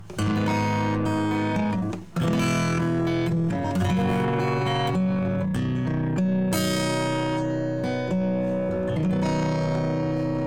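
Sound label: Funk Guitar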